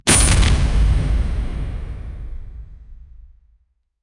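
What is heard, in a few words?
heavy metal clash shockwave; camera shake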